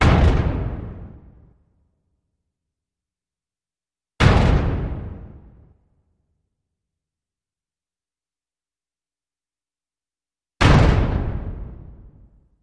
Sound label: Metal Slam Multi, large impacts